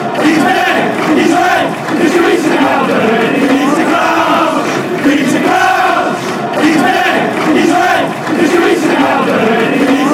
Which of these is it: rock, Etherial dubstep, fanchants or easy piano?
fanchants